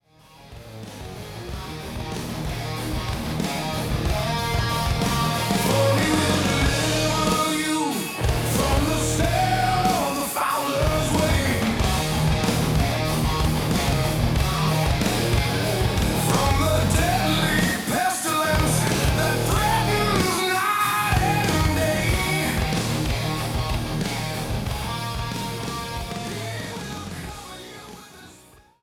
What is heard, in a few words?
Rock Style; Psalm 91 Security